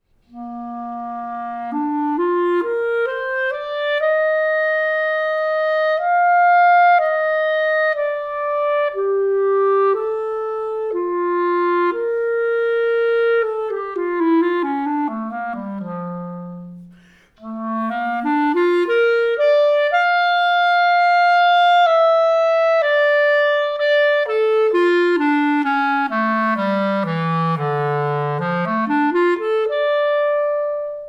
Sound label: Metal Clarinet